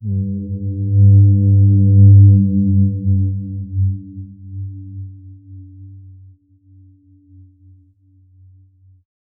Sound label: low horn prison siren